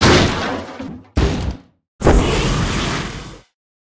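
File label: death, monster; karajan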